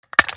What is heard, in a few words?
ar reload empty magin; weapons; akilo47; viper